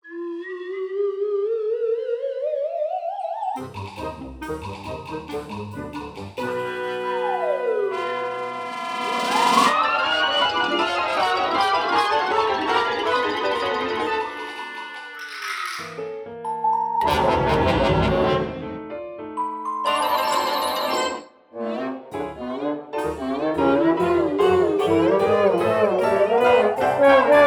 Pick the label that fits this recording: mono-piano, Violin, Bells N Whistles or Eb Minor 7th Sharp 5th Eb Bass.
Bells N Whistles